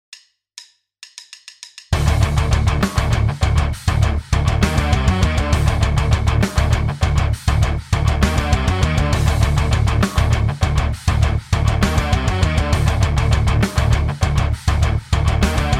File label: rhythm